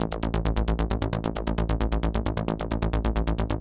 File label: bass